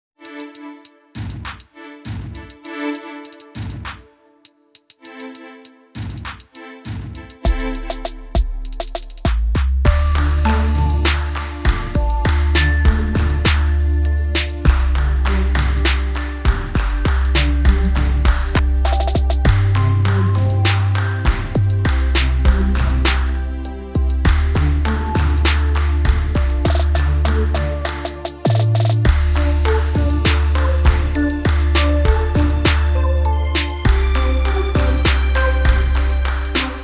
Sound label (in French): Vive le vent PB; Dire une comptine sur un fond musical SLAM